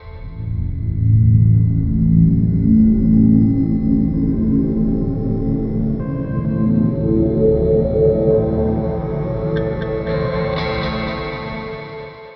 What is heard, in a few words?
Soundscape; FX